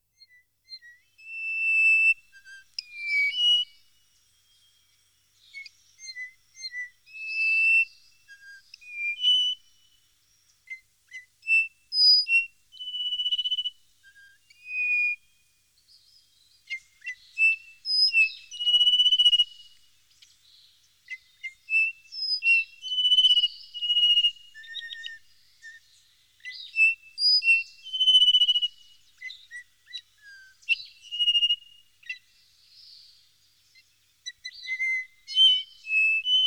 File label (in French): Siffleur des montagnes; chants d'oiseaux; Jean C. Roche